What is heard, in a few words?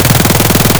glock18 outdoor close loop; Escape from Tarkov